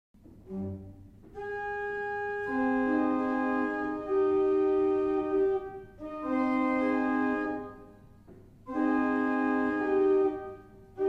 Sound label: Damaged, barrel organs; Meldreth